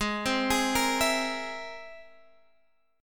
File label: C Augmented 7th Ab